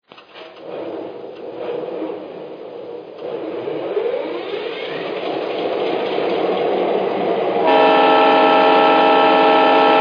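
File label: GP35, locomotive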